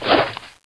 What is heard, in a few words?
punch miss